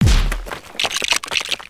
Smashable Stone; destructibles

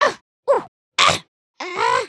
soccer; emotes

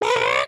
chicken cluck long; emotes

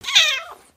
Kitten Meow; creatures organic

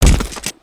Skullken Hit; creatures organic

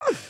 sleep turn; emotes

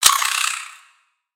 Spider Get Hit

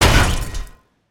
Spider Boss On Hit; creatures mechanical

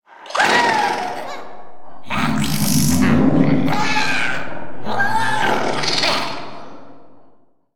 Monster Crowd; ambience

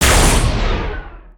Spider Boss Fire Shot; creatures mechanical

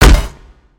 Spider Get Hit

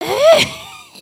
fart; emotes

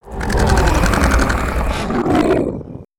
punce, creatures organic

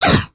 VO Walk Run; creatures organic

